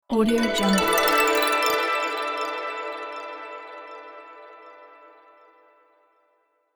awaken spell

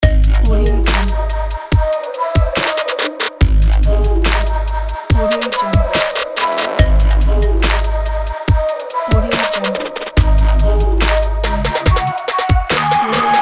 trap opener logo

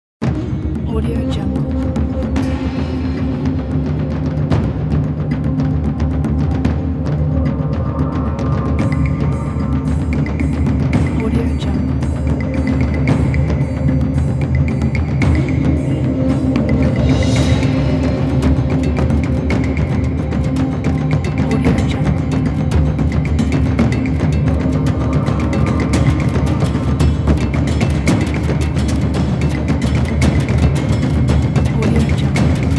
jungle chase

